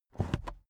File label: car headrest full adjustment fast soft